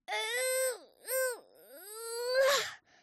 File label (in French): Grenouille Efforts Longs; mirumiru